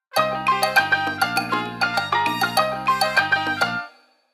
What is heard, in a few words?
Salsa Time, ringtones; BQ Russia 5732L Aurora SE